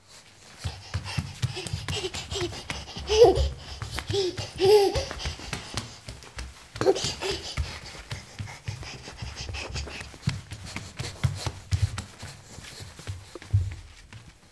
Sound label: Running Baby